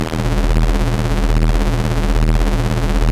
Tron Tank Loop

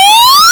Coin; Galaxian

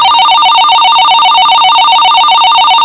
Chatter; Galaxian

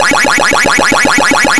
Ghosts

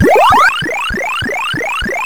Eat Ghost

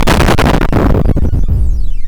Defender Death

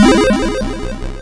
Cascade; Discs Of Tron

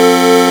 Tone; Track'n'Field

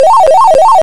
Capture Ray; Galaga

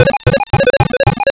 Spider Loop; Centipede

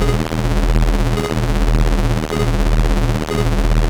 Tron Tank Loop

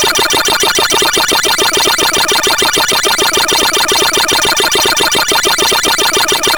Eat Dots

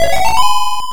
Coin; Track'n'Field

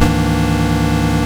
Chord

